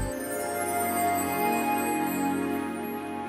Flutes Transition

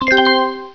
Startup

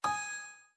disconnect; braillesense

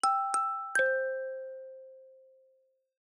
Go To Sleep Haptic, iOS10 UISounds; nano

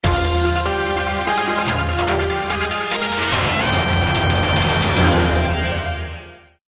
Commercial In; Kaun Banega Crorepati S7-10; Millionaire Soundtrack; Indian Soundtrack [2011 - now]

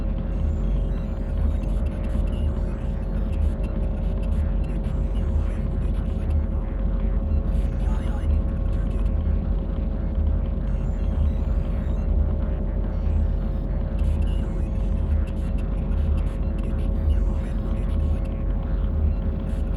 AMB COMMUNICATION

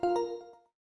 Speech On; Windows; Glass

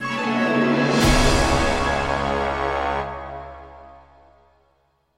Lose; Millionaire Soundtrack

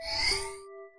Delete; Linux

